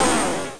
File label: freezer end, sound/weapons